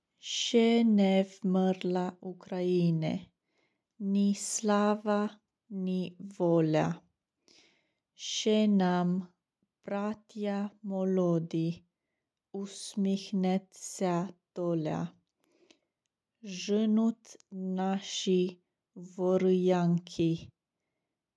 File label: Words Ukrainian anthem; Second Tenors; Ukraine concert